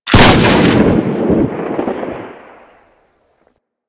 Carrier Sent Shotgun Fire, weapons; DE; Warframe; Sentinel

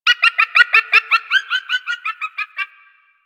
Forest Animal Laugh; Warframe; Ambience; Grineer Forest

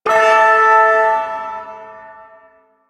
Shawzin Chromatic Chord J; Music; Chords; Duviri; Ersatz

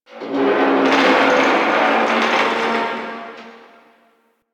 Asteroid Field Ship Metal Creak B; Warframe; Ambience; The War Within

